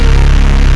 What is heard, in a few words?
Arcade Riffs